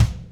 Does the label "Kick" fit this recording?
Yes